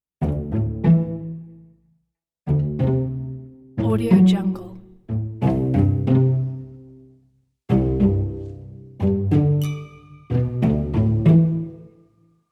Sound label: experimental quirky pizzicato